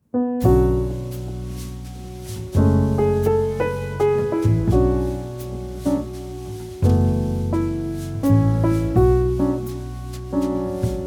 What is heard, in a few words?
Smoothjazz